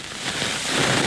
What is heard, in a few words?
on fire, molotov; reapi molotov; weapons